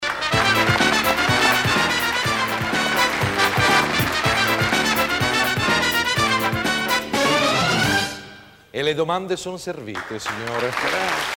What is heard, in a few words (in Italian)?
stacchetto carrello; Stacchetto delle domande finali - 1985/92